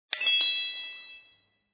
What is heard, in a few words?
ring higher; butterfly island adventure